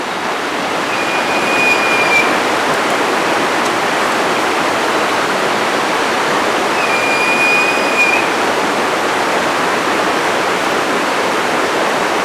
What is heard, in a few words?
Song of Eurypyga helias